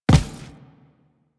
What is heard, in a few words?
Footstep Default